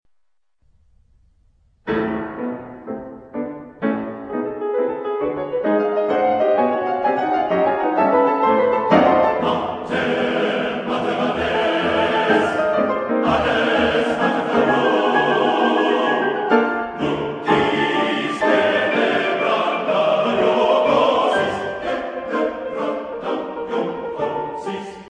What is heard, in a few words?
Tarantella